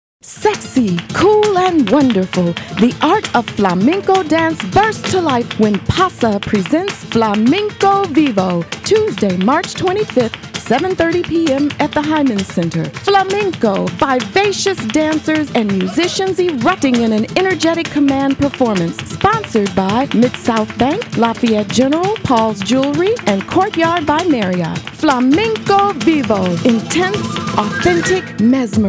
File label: flamenco